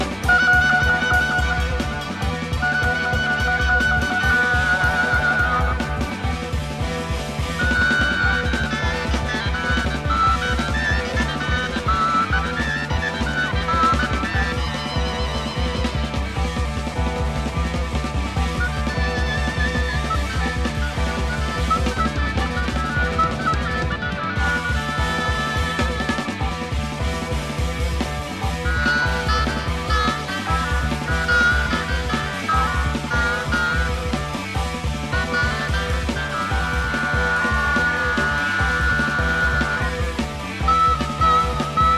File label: Four Gongs Two Drums